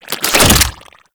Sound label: Infested Collar Attach; Warframe; Infested Alad V